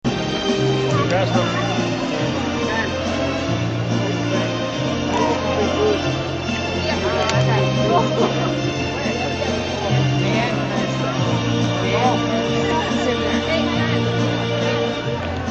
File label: ring nat sound